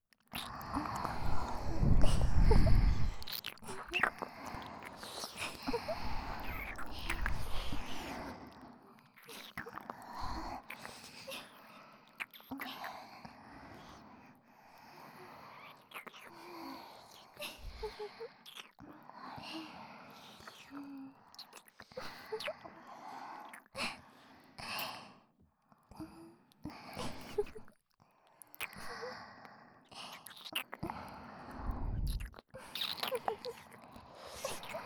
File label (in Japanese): 耳舐め責め; ムーンストーンのみ